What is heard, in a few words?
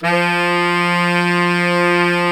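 TENOR SAX, Sax section; Giga Samples Collection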